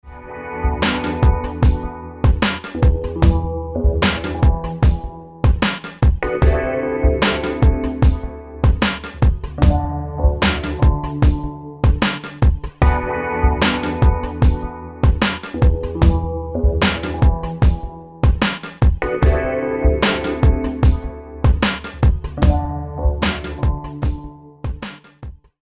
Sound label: chillzone; akai